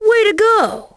Correct; Lego My Style Kindergarden macOS Windows 2000

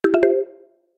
Wireless Charging Started; android 11 ui sounds 2020

